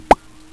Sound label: Bubble; Escape Beyond 2001